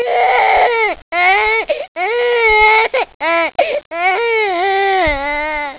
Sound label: wah